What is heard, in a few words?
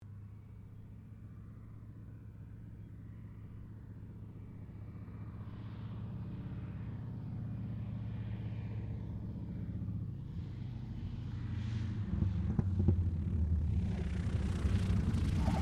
AIRCRAFT AT-6D HARVARD MK III LAND